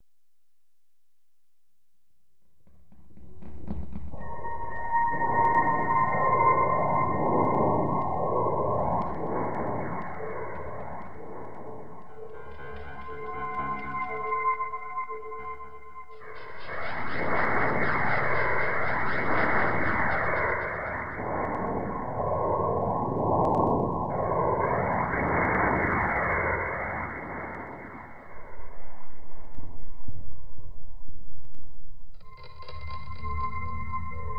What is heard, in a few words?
G Mixolydian 60, Deconstruction